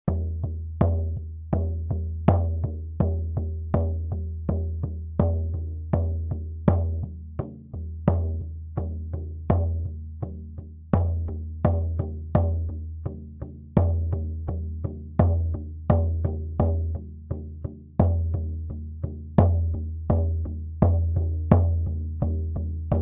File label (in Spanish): TAMBOR CHAMANICO